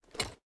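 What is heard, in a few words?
footstep armor; smite; kuzenbo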